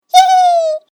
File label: happy; Flashpoint; tobiidynavox; catchme